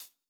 Hihat Closed Soft; One Shots; Drums

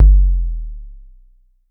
Kick Sine Slope Gentle

